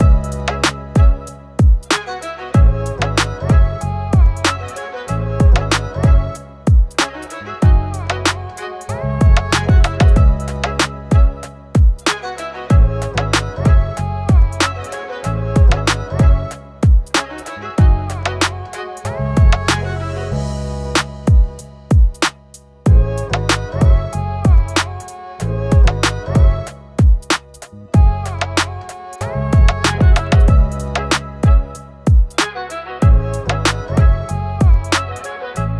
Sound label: 94.5bpm C Minor